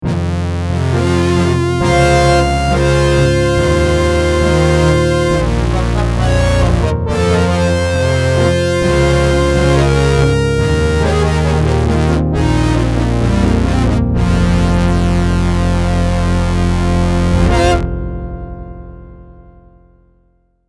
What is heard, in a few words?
Blow the Edge, Prophet '08